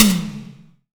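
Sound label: SDS V SNR, snare; Best Service; Real Mega Drums VOL-1; SDSV KIT2 GM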